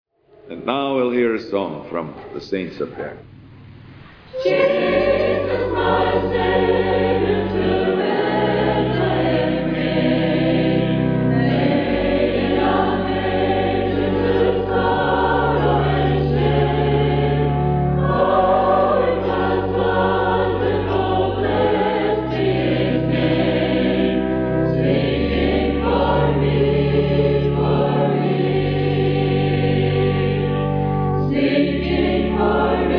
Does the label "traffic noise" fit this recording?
No